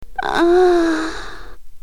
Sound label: Aahhhh (Women)